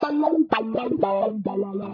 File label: filta, guitars